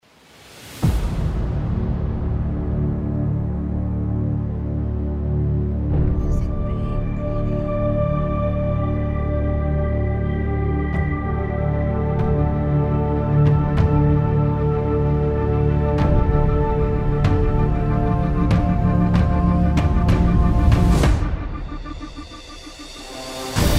Epic Trailer Music

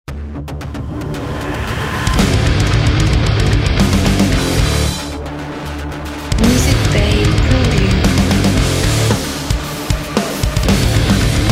Short Metal Trailer

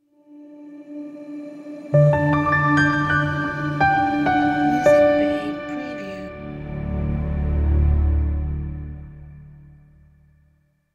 Piano Elegant Logo

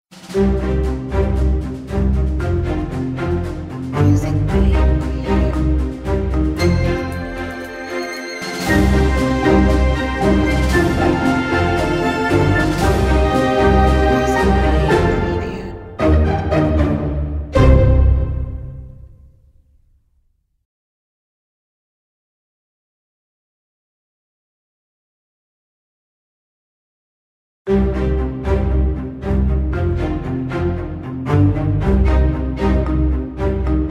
Orchestral Logo

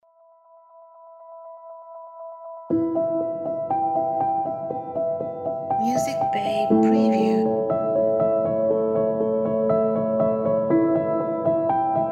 Documentary

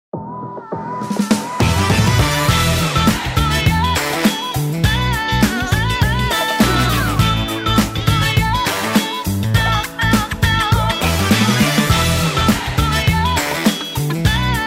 Stylish Upbeat Hip Hop and Funk Background

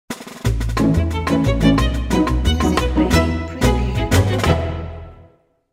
Show Ident

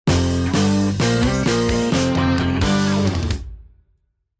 Classic Rock Logo

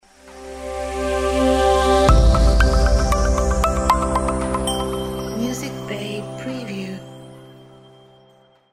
Branding Logo

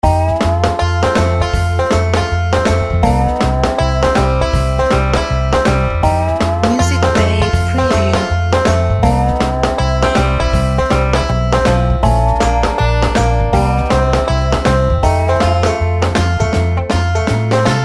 Banjo Shuffle

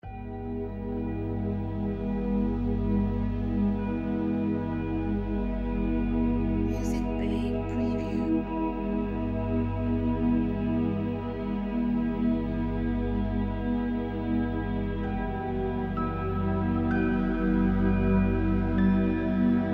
Epic Thrilling Survival Horror Ambience

